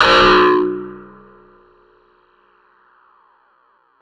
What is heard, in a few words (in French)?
Guitare echo